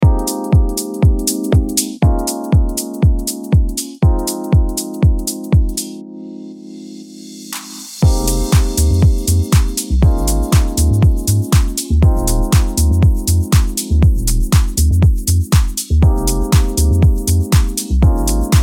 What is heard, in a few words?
launchpad in F minor